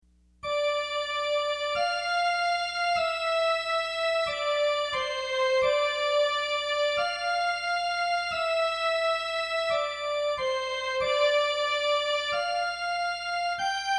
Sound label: Documentary, Movie Music